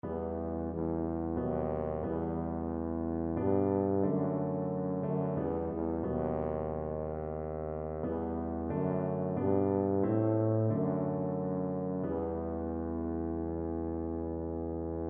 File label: coventry, tuba